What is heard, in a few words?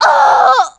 FEMALE DEATH; combatarms